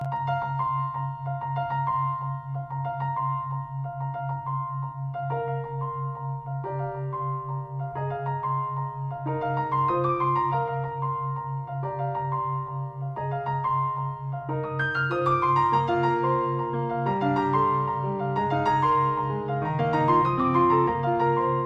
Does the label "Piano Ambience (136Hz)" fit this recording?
Yes